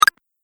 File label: Pickup App Game; Video Game Sound Effects